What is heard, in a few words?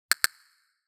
self assured notification